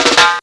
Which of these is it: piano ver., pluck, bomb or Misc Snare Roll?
Misc Snare Roll